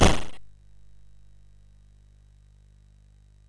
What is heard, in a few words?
Power of Black Bandit, MOS6581R2